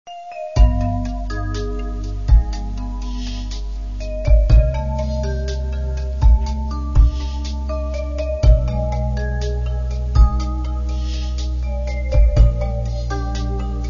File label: quiet music silent night